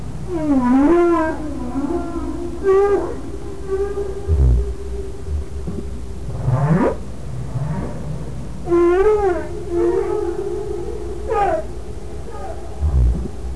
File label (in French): baleine a bosse